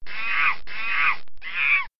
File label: monkey